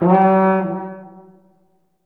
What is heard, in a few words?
horn; the lord of the rings the two towers; gc ps2 xbox